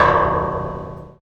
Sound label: Esplanade Sign Perc; Navigation; Houston